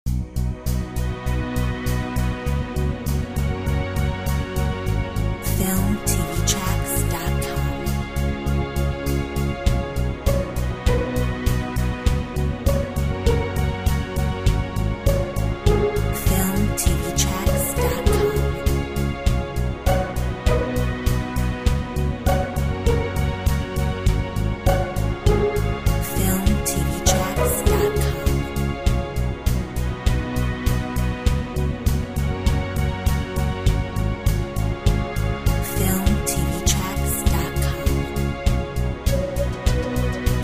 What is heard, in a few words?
Ambient Pizzi